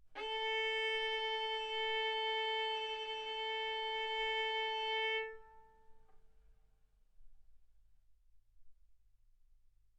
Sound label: Violin arco mf sul A A4 B4 stereo